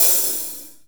hi-hat open mp, drum kit; unpitched percussion

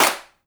clap ff, drum kit; unpitched percussion

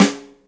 snare mp, drum kit; unpitched percussion

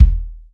bass drum mp, drum kit; unpitched percussion

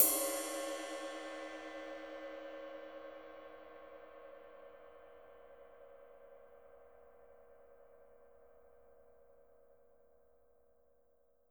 ride cymbal f, drum kit; unpitched percussion

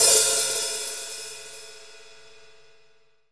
hi-hat open ff, drum kit; unpitched percussion

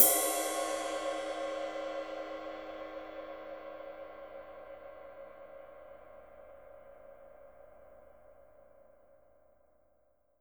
ride cymbal ff, drum kit; unpitched percussion

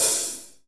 hi-hat open mf, drum kit; unpitched percussion

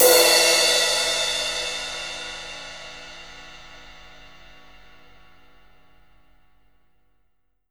crash cymbal mp, drum kit; unpitched percussion

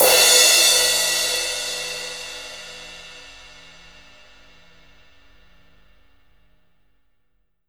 crash cymbal mf, drum kit; unpitched percussion

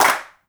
clap mf, drum kit; unpitched percussion

